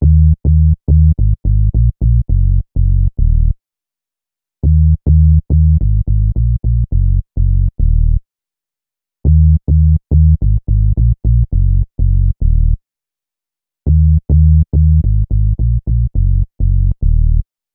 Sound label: Bass Hip-Hop